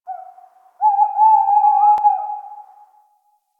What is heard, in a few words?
Forest Owl; Ambience; Warframe; Grineer Forest